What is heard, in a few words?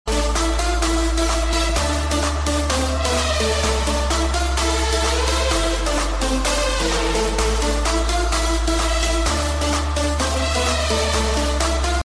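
house saxophone ringtone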